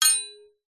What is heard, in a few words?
warfare knife large strike hit another clunk